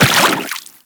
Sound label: Run Water; Footsteps; Warframe; Teshin